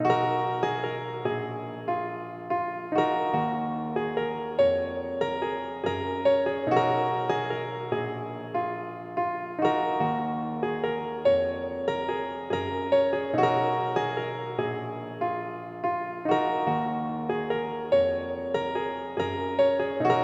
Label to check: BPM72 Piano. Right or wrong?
Right